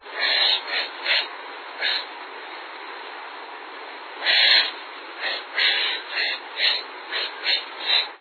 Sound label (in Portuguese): urubu de cabeca preta